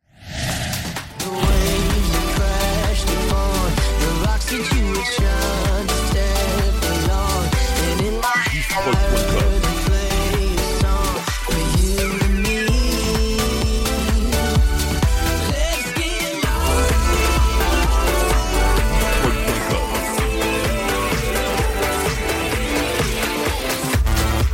Medley Electro 2010 IDM

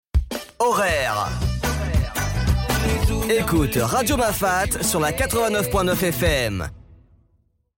Radio Jingle; Radio Mafatt; Aurere

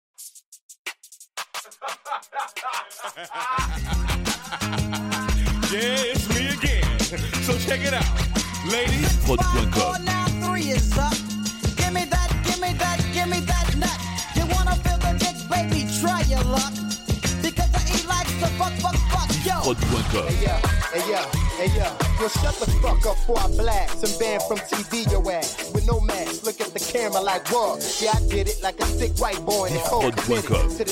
Medley Rap US

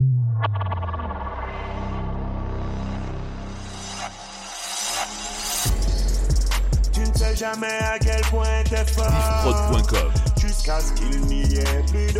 Medley Urban

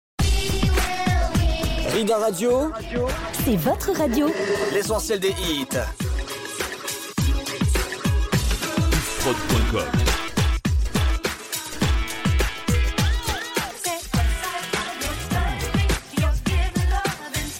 Medley 80s

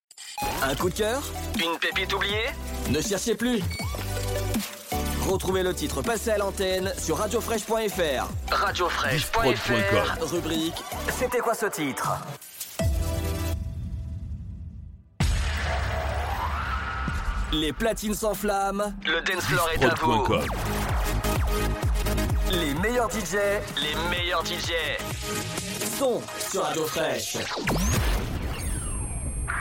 Radio Jingles Emissions

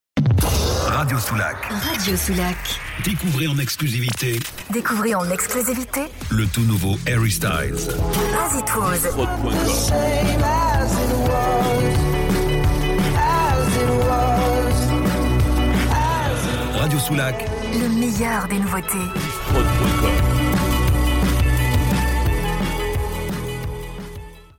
Power intro; Radio Soulac

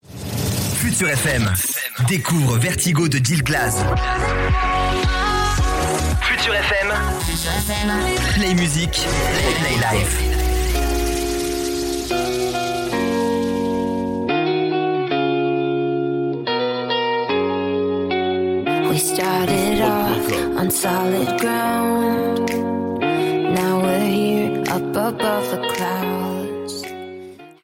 Power Intro; Futur FM

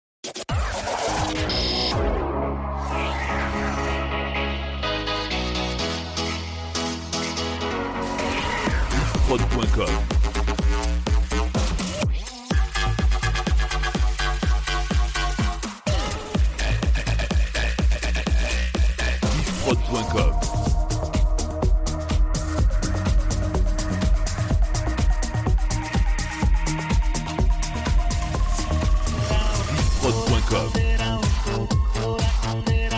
Medley Electro

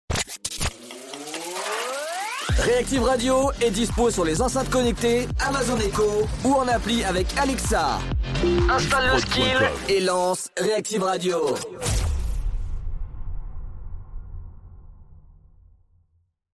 Radio Jingle Applis; Reactiv

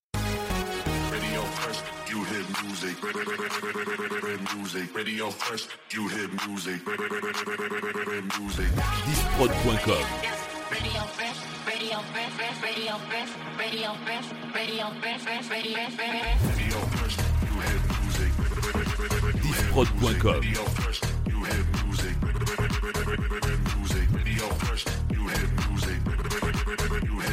Rampe Phonk 125bpm

